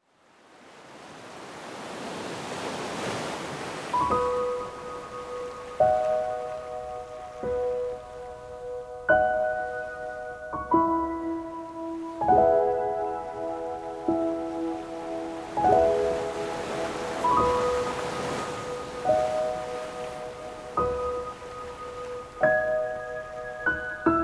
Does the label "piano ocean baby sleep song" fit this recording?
Yes